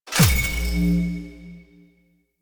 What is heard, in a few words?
Railjack UI Payload Flare; DE; Warframe; Railjack Player Ship